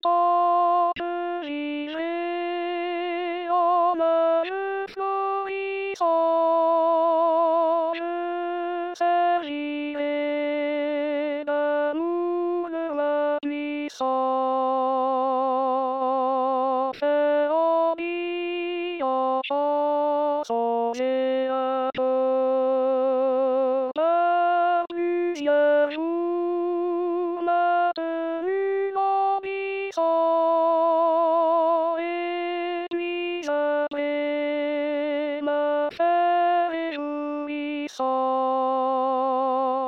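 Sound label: alto; sermisy; tant que vivrai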